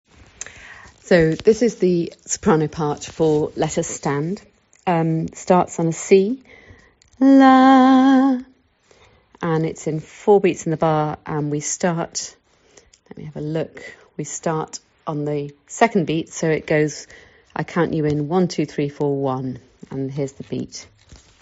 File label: soprano; The Climate Choir Movement